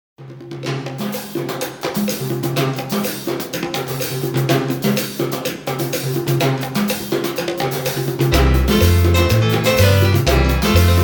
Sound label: Merengue